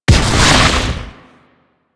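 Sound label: Orange Mine Projectile Explosion; Warframe; Venus; Venus Commander Orange; Abilities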